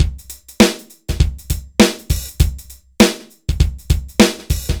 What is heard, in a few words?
dry loop, BPM100